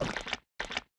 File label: crab idle